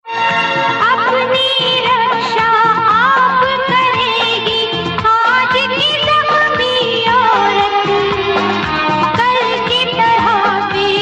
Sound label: Female